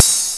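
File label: open hihat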